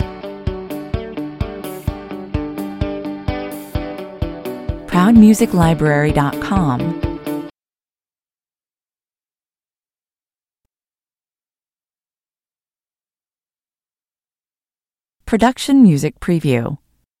corporate loop

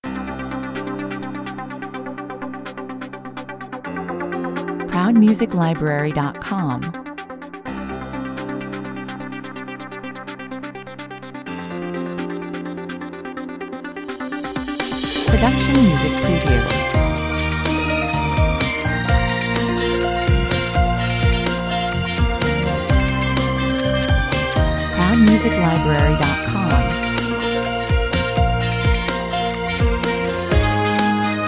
ambition score 126 bpm

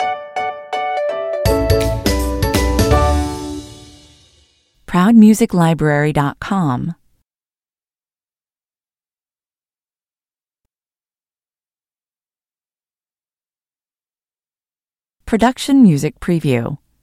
happy explainer stinger

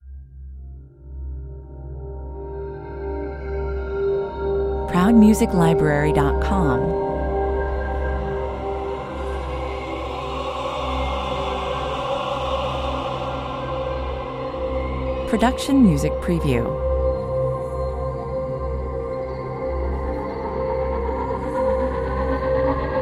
sphere with choir

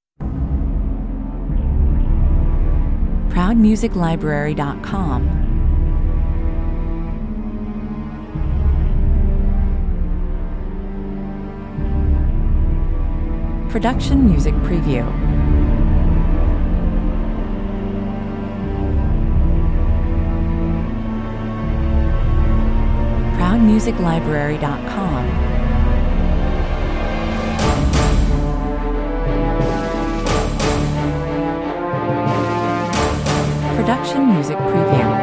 A gritty and powerful orchestra track; The middle part at around 0:53 may be used separately as well.

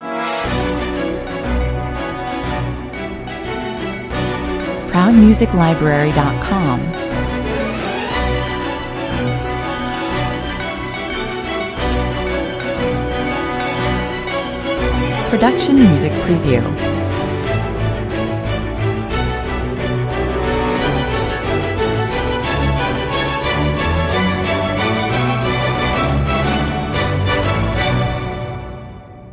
no choir no perc

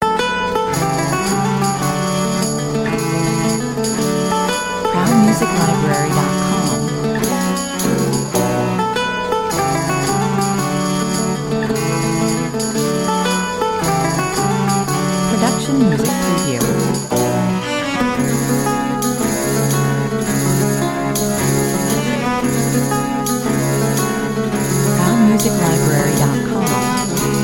tarantella 74 bpm

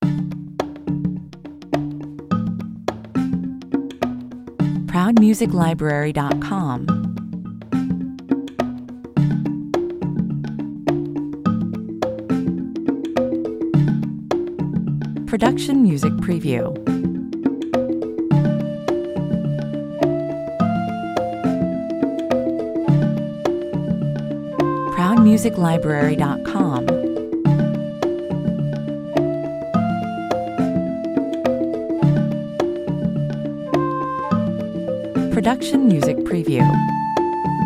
jungle beat world 105 bpm

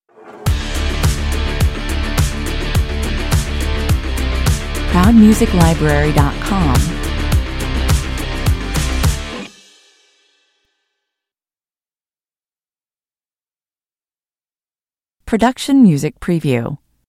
stinger

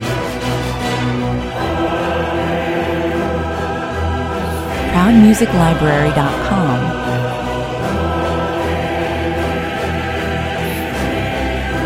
no percussion

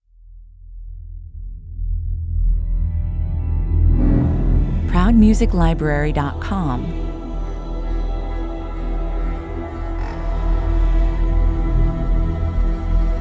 with choir, 120 bpm

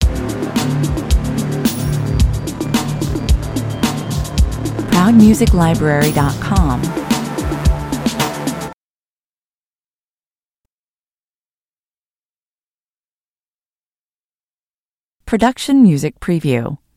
(loop drums)

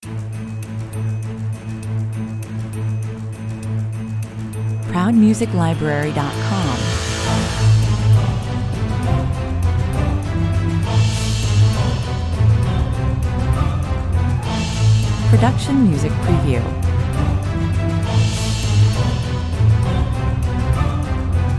deranged choir

